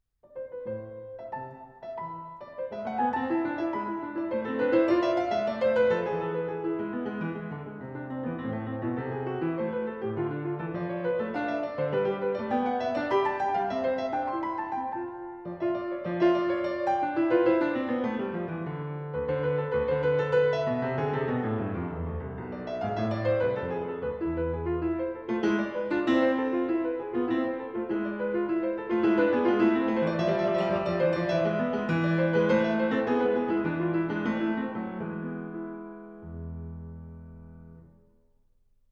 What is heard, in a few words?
Partita no. 3 in A minor, BWV 827 - Courante